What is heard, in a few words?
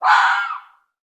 animals fox red mating call single; sound effects one